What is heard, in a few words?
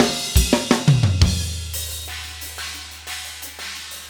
6 count transition fill